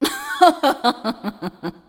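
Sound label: laugh, Chinese; Katarina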